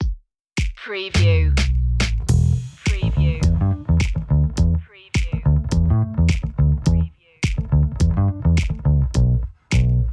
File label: curious cat drums and bass, funky groovy